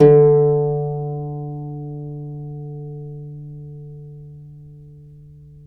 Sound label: Celtic Harp, Plucked Strings